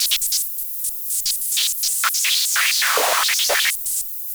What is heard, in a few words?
Yamaha CS5, Filtered Noise